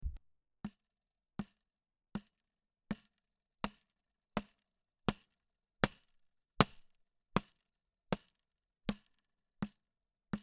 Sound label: Hyper Cardioid 3.5in from Tamborine Head; Little Gem